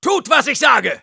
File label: jeers, de; Soldier; "Tut was ich sage!"